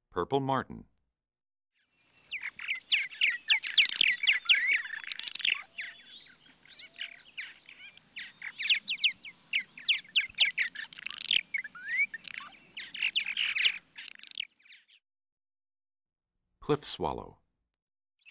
Purple Martin, Cliff Swallow, Barn Swallow, Tree Swallow, Northern Rough-winged Swallow; Birds; Eastern-Central; Bank Swallow